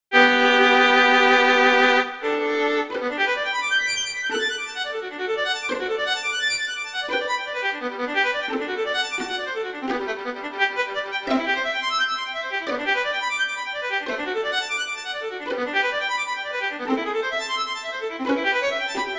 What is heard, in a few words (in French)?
Les arpeges; Variation III; Wieniawski; Ecole Moderne